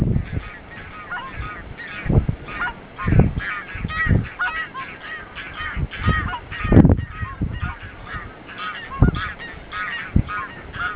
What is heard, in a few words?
swan